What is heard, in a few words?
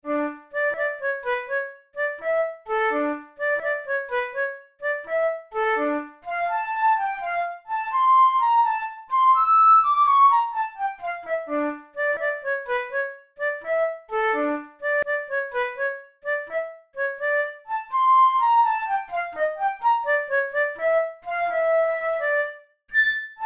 marche funebre, flute tunes